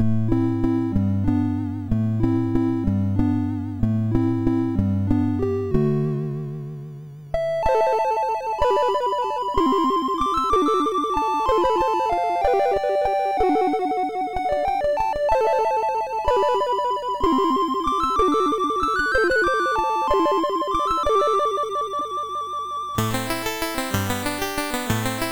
sid, MOS6581; MOS6581R3; Stein Pedersen; Haavamaal

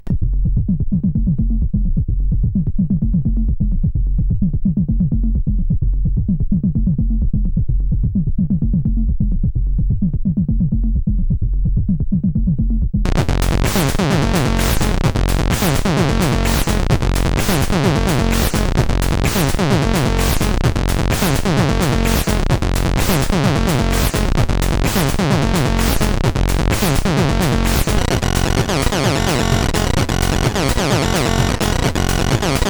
Plasma303, CSG8580R5

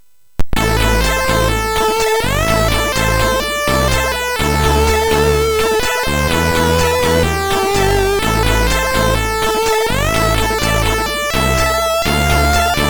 Jingles, MOS6581R3; hvsc

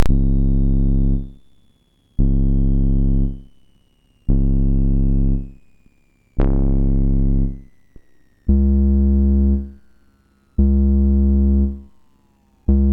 Synth Samples Bass, MOS6581R4

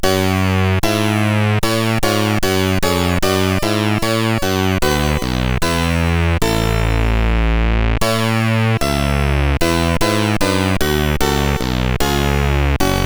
Bach CSG8580R5

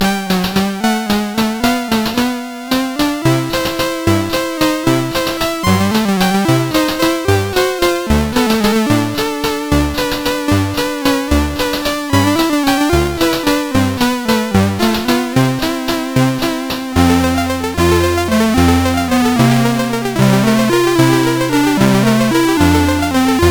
Paddle Mania, CSG8580R5

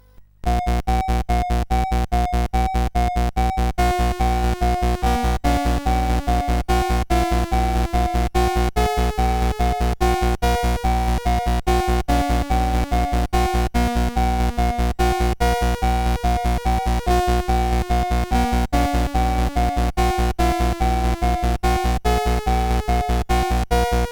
Spooky, sid CSG8580R5; hvsc

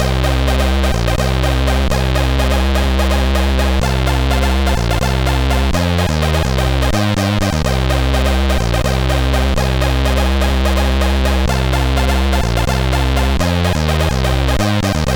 Laxin, CSG8580R5; hvsc

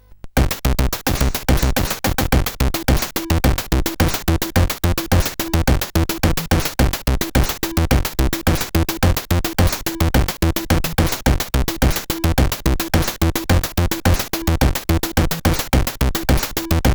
sid, 8580R5

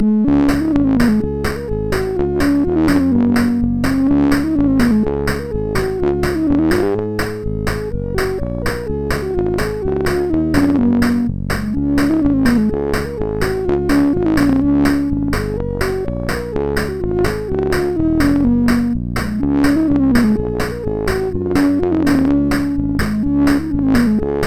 sid MOS6581R4, GAMES; Aussie Games

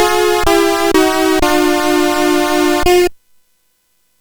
Sweep, CSG8580R5

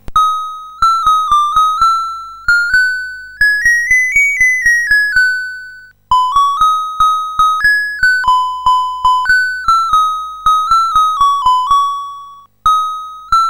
CSG8580R5; sid

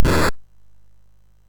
Dragons Den, MOS6581R3

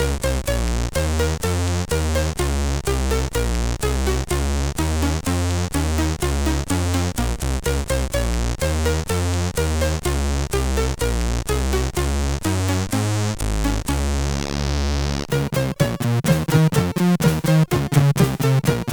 sid CSG8580; HVSC; Borree

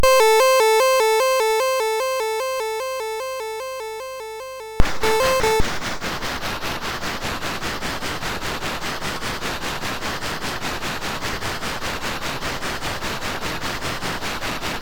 Steam Engine, MOS6581R3

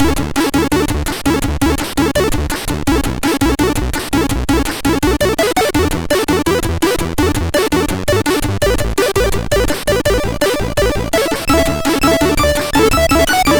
Xmas, sid MOS6581R2

